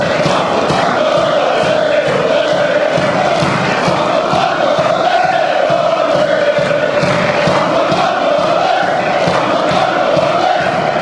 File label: forward malmo ole, fanchants